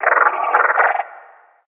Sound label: advisor screen; episodic; Source engine